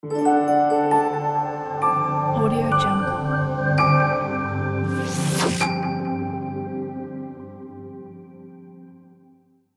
good news logo ident

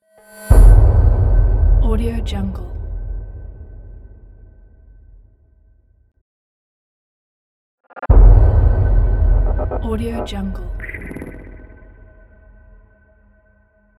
boom

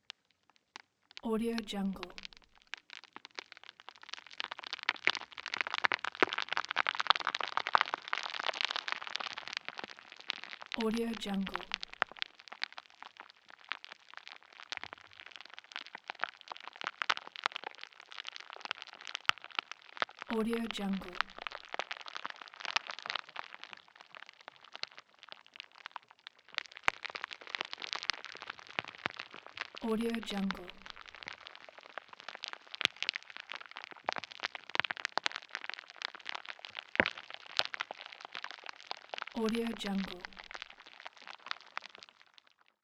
timelapse rot disintegrate melting crackle

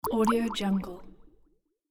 abstract water drop

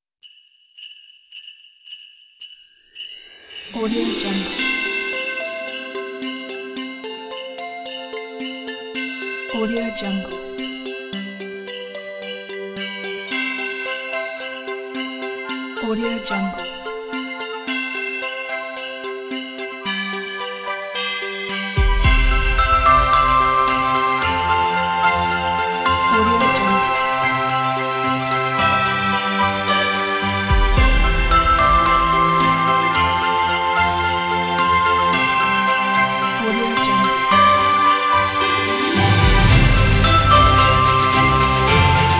christmas opener

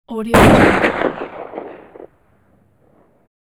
rifle blast with echo; hollywoodedge

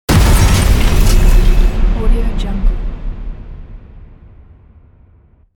horror tension thriller

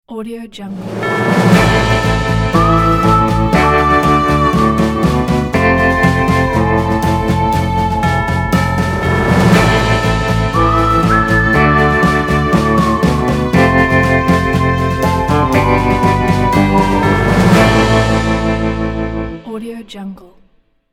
western

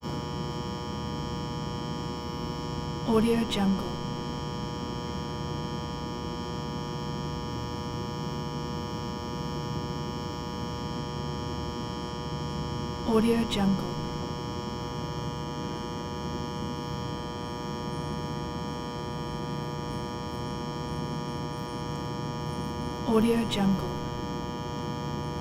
transformer electric buzz hum

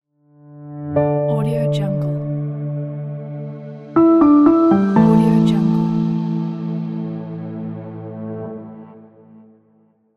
intro piano logo; teasound

